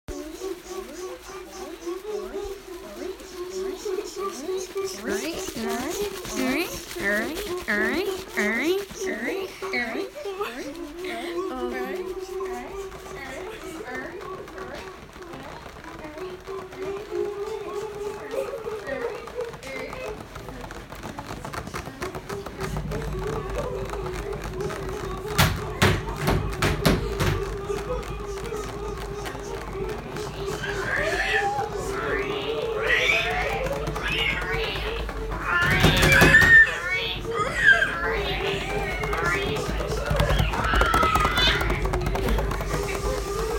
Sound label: tropical rainforest